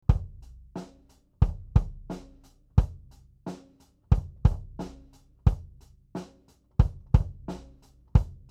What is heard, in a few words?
kick loud 15cm